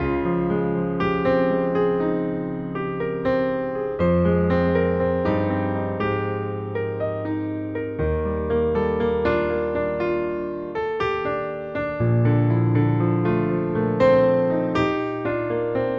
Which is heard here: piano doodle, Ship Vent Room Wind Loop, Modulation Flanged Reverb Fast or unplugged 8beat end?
piano doodle